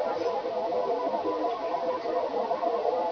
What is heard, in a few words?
amb misc, ambient; Quake; PainKeep